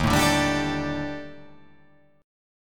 D sharp Major 7th Flat 5th D sharp, chord

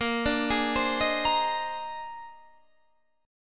Bb 11th, Guitar, Open D

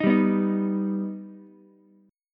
D sharp Augmented D sharp Ukulele; D#+ strummed; JGuitar

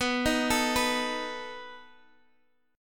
B 7th B down; arpeggiated

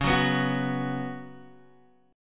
C sharp 7th Suspended 4th C sharp, Guitar Open G

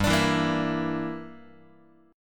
G Minor 6th, Guitar; strummed; x,3,5,2,2,3; 4A,4E,5A,5D,5G#,6C#; JGuitar

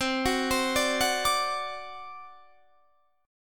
C Suspended 2nd Flat 5th C down Guitar Standard; Csus2b5 arpeggiated

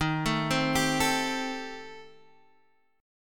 D sharp 6th Flat 5th D sharp; D#6b5; arpeggiated; 11,12,10,12,10,x; chord shape

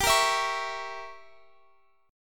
G Diminished 7th, Guitar, Open F; Gdim7 strummed; x,x,19,17,19,20

